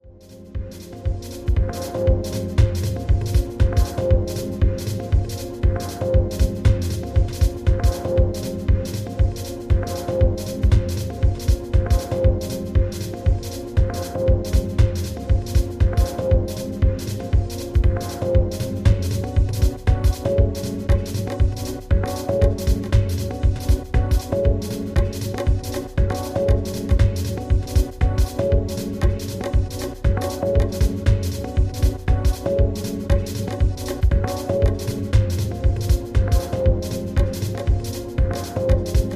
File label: drum dance